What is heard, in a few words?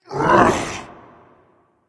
grunt; smite; geb